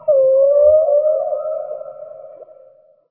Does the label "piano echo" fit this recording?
No